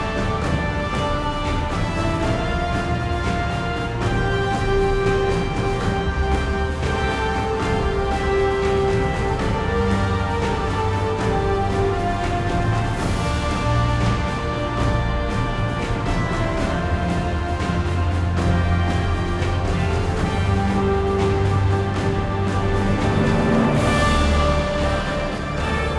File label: Explosion (Reprise); Mass Effect 2